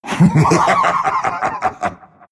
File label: laugh; doom bringer